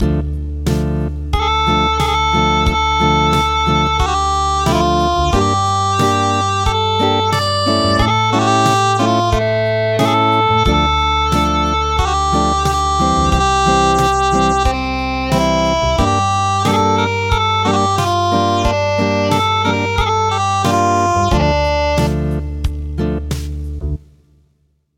Acoustic; White rice with a moonlight

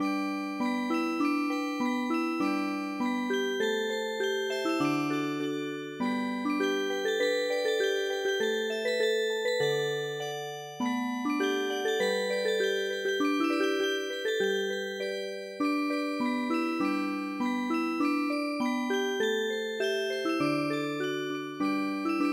Square Music Box; midi; traditional

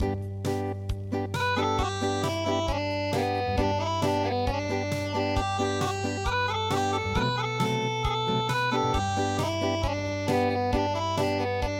Unplugged, midi

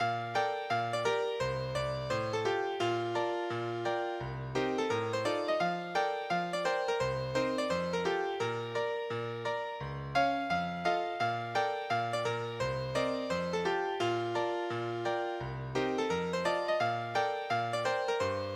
Rag Time

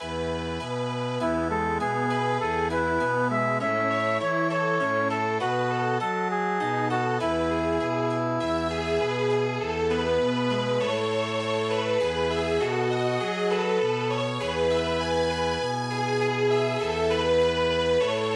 Chamber Music, midi

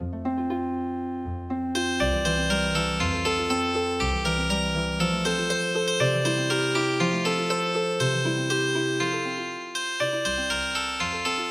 cheerful Child German Healing Harp

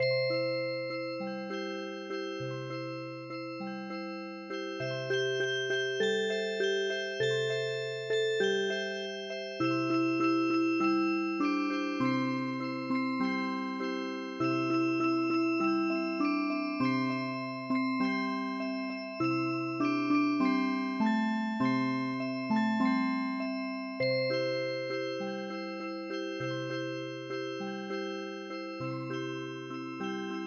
Music Box, midi; Sal Got A Meatskin

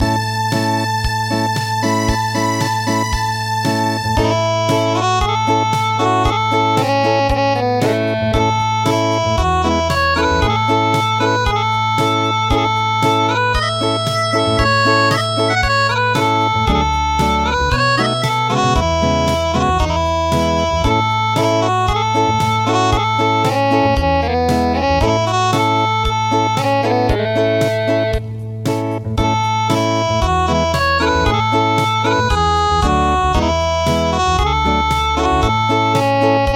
Acoustic, midi

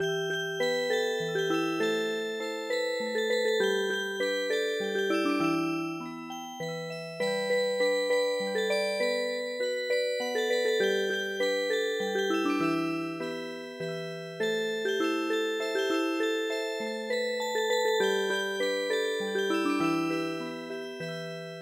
chonan samugori Music Box

